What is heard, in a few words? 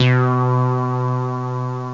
Squelchy 303 C2, Bass Hits